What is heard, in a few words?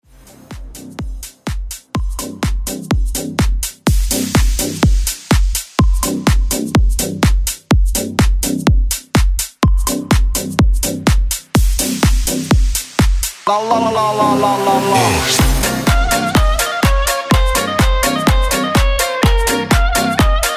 Bass House 125 Bpm Clean